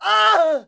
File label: plyr ht; quake; halo; hurt